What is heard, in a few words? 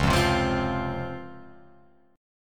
Db Major 7th Db down Guitar Open F; DbM7 strummed; JGuitar